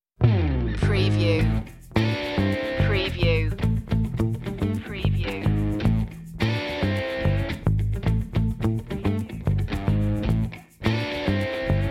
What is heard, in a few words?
casino funk